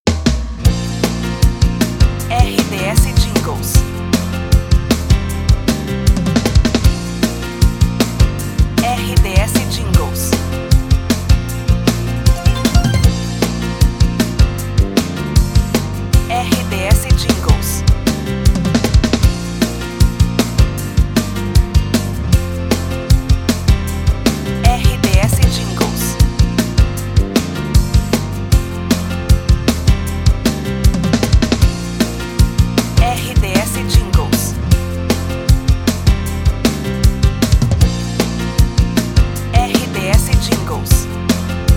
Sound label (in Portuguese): Trilha para locução; Radio Legendaria 2023 Theme 04; Tema 02 Abre Hora